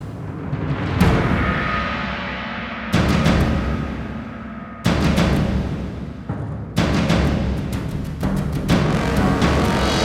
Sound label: Battle F#m 125bpm; Disney Infinity 2.0 Marvel Super Heroes; Arachna